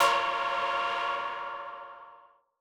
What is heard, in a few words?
M Dynamic Tech A#3; KG MegaSaur